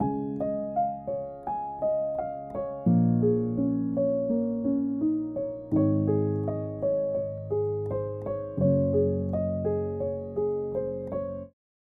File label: pad